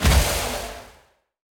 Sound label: Magic Powder